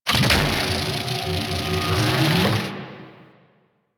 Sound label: Super Weapon Laser Full; Warframe; Caliber Chicks